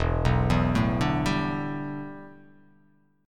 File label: E-Minor 11th, Em11 chord; arpeggiated; JGuitar